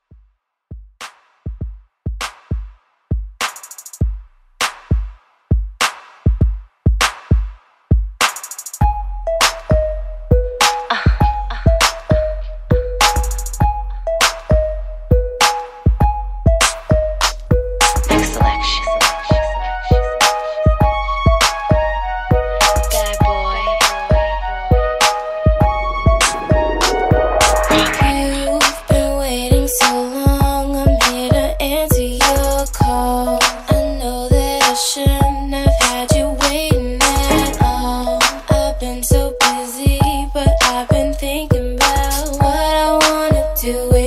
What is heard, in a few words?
RB Redrum 100 BPM